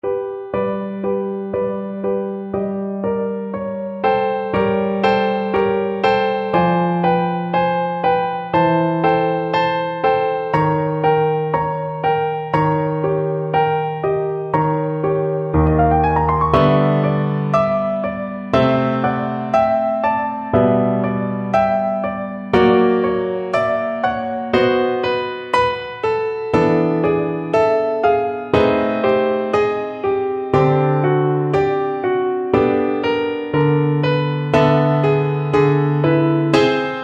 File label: romeo piano